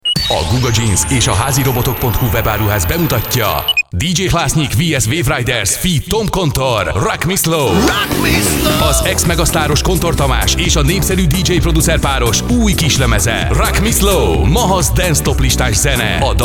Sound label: rock me slow